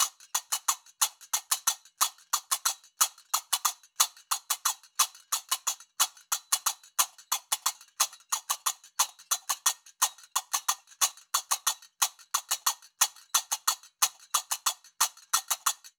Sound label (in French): loop ternaire tempo 120; Qaraqab; qraqeb; Maroc